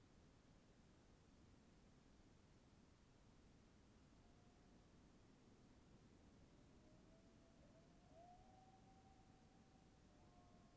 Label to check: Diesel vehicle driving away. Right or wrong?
Wrong